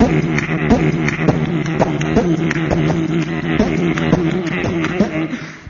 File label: hizzy, Beatbox; Drum loops